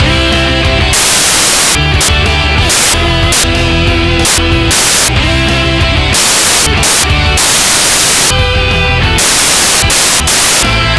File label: rock, bgm; pictcode